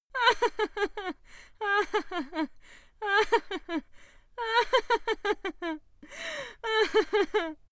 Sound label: female laugh